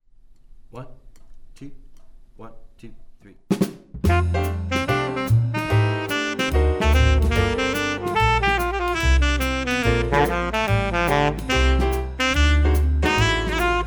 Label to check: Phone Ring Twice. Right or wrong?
Wrong